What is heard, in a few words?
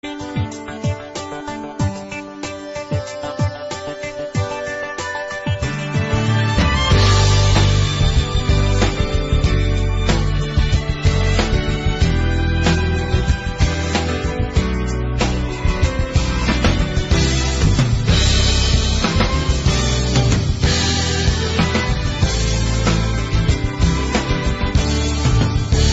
Flower tune